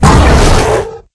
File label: gorilla; silverback